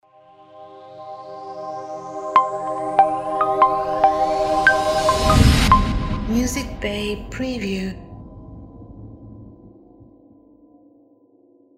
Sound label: Modern Logo